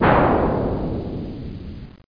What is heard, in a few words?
Synth Sh; Instrmnt; Sound Library 2000; Wayzata Technology